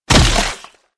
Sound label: body impact; Physics